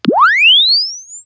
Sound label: press one finger Chirp; Samsung 4 Recordings